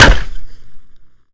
Plank Hit; zps; Weapons; Melee

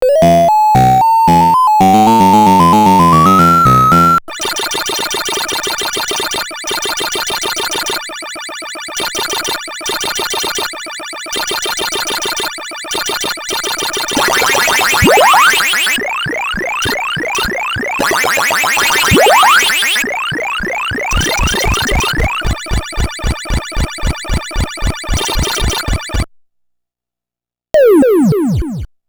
Arcades; Ambient; zps

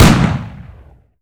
Remington 870 fire; zps; Weapons; Firearms

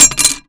Machete Collision; zps; Foley

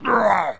Zombies Male Jump; zps; Male1

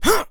jump, Human Male; zps; HumanMale-01

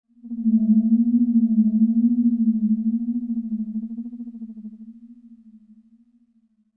shredding synthhit; zps; Ambient

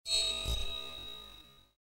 metal scrape; zps; Ambient

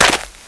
dirt, player; svencoop

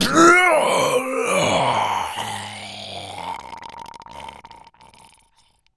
Death, Zombies; zps; Carrier

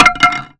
Bat Collision; zps; Foley

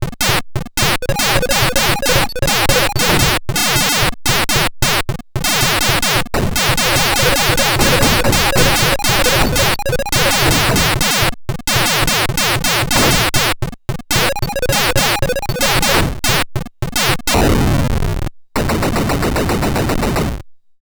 Random Arcade, Ambient; zps

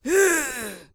pain, Zombies; zps; Vanessa

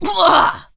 pain, Zombies; zps; Z Waitress

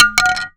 Aluminum Collision, Bat; zps; Foley

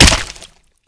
Flesh Arm, Gibs; zps

